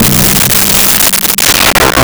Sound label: Timpani C3; Nintendo; July 2020 Gigaleak